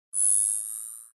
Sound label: ui zoom in long sound